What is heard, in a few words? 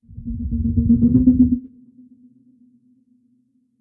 MOLOCH attack